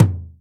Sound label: sharp tom drum single hit f key, Royality free tom drum sound tuned to the F note; tom drum samples